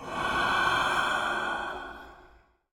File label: Ghost Death